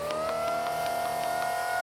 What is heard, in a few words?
Wind Up; Ed Edd n Eddy; Adromulus Pack